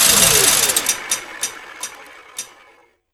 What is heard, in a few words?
machine gun wind down; tf2classic